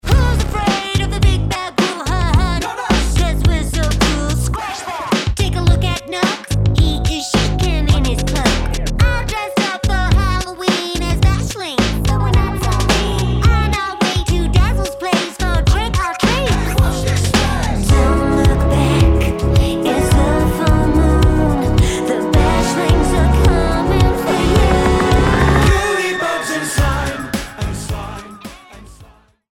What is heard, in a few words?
Animation Sung; Smashllings